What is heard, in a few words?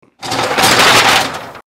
rollerdoor